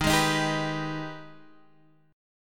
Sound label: Ab Minor Major 7th